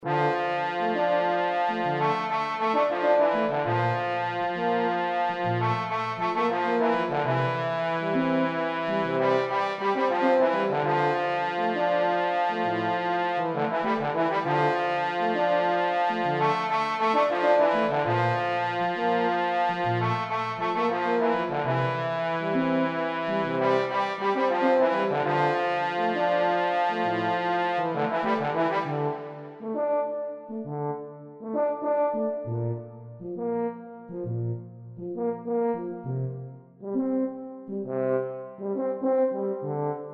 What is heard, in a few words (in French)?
Trombone Tuba Percussions